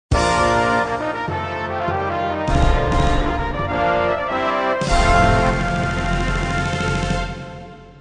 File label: inflight success; Flight Commander